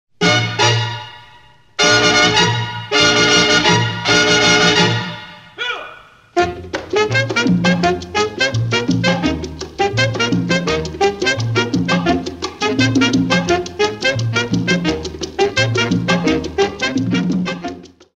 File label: riff